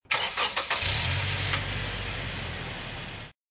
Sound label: zamboni